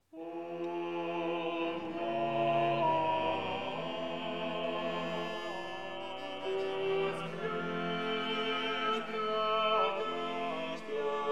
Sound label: Chanson